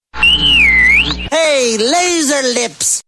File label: laser lips